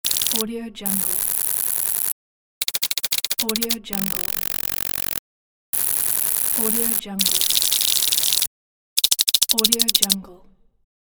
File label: sci fi digital typing